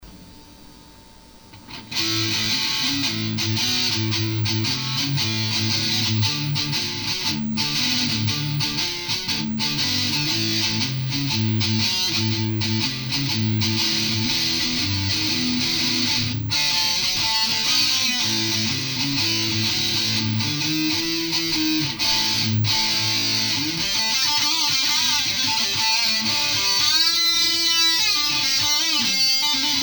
blues

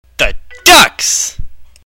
ducks

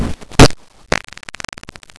fart

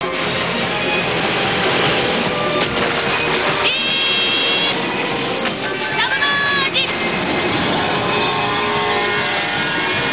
neptune deep submerge

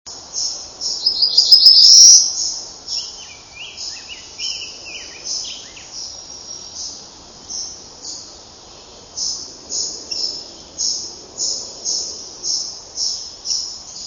grosbeak rose-breasted with BT Blue; birdsongs

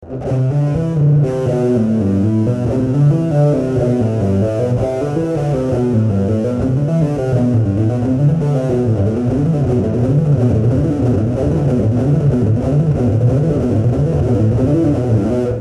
legato

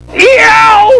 yeow

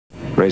phasers

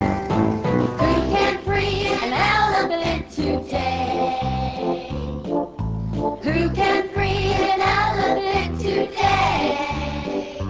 The Elephant Song

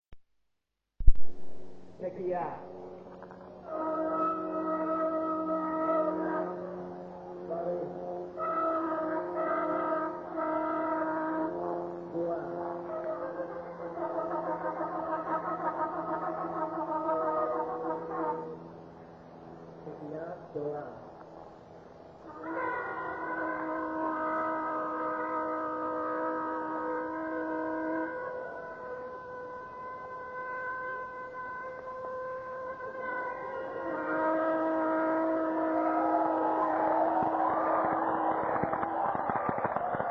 shofar

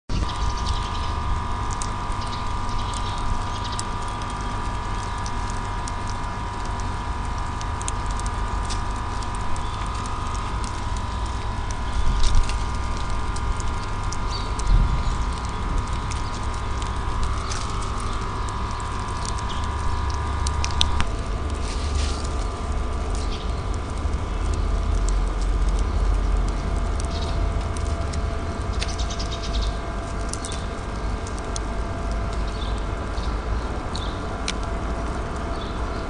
Locust

